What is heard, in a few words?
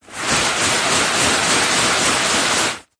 household cleaning wiping carpet with dry tissue paper circular motion; zapsplat